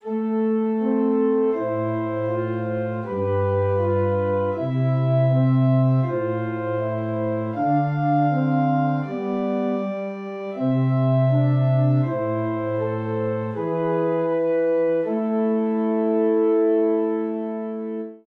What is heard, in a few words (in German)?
Zwei simmiger Contrapunkt; Ronge; Versione originale di Beethoven